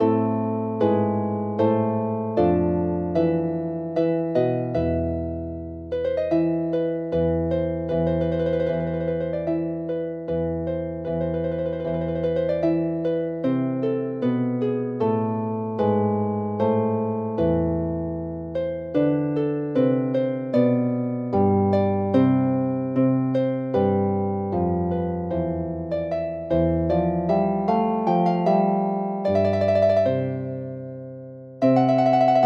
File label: mock nightingale, harp; Variations on Traditional Welsh Airs; dynwared yr eos